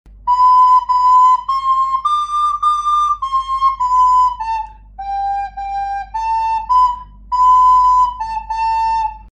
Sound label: Flute recorder